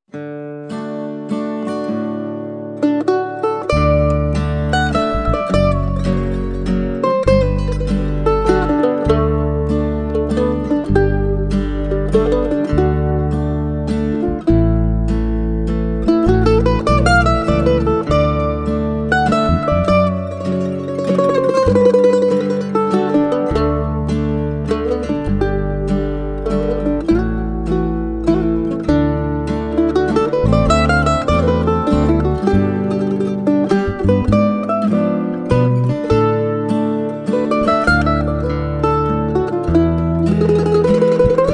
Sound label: mandoline